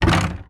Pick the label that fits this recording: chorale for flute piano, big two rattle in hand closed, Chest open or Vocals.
Chest open